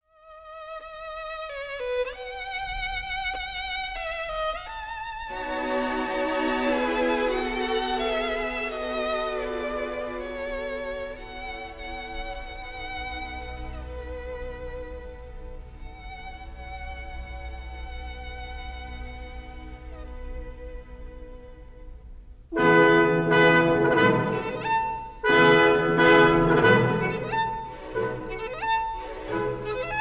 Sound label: Violin Concerto; Mendelssohn Violin Concerto 3rd mvt Campoli